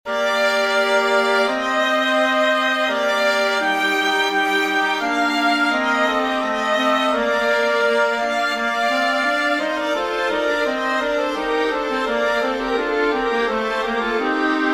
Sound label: haec dies quam fecit dominus for winds strings